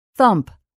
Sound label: thump